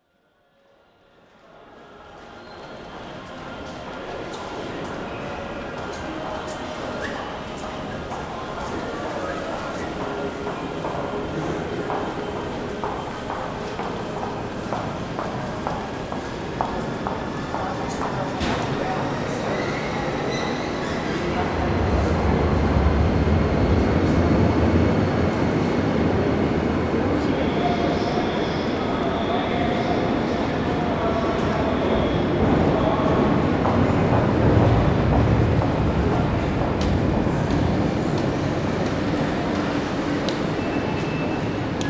Passage Gare

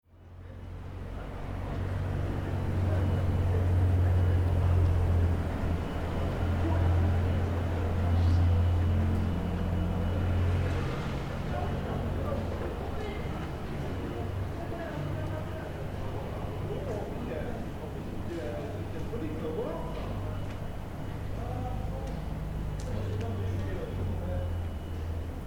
sociabilite nocturne; cartophonie

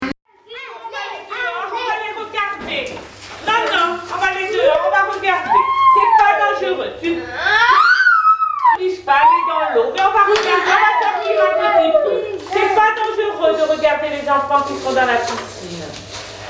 Manifester Peur; pathologie

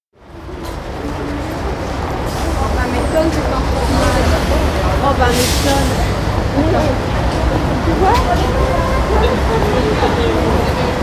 prototypes sonores architecturaux glissando

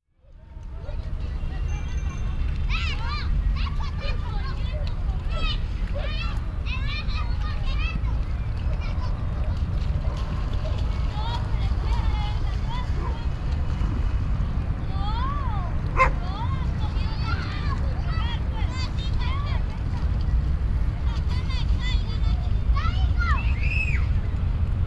bogota tercer milenio jeux eau

